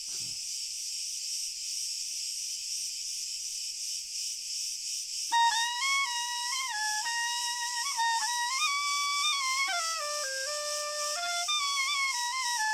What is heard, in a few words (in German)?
Frei OTon Spiel Grillen Chor Fern